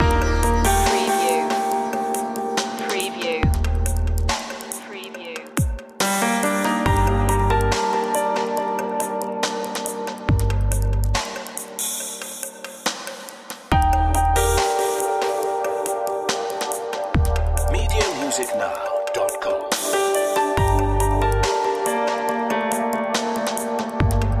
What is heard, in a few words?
tranquilizer loop